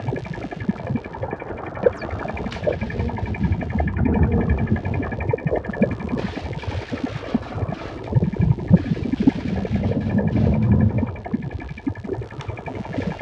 biolab loop; factorio

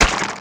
mining; cuttlepop; Factorio

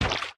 mech armor land semi wet; Factorio

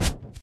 mech armor land grass; Factorio

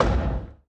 riser stop, electromagnetic plant; Factorio

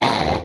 stomper warcry, enemies; Factorio

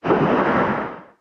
stomper idle big, enemies; Factorio

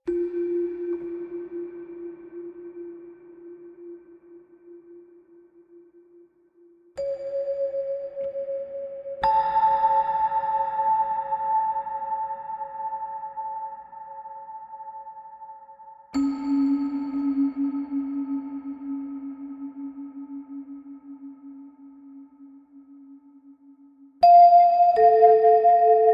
melody a, ambient

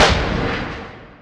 asteroid damage metallic big; Factorio

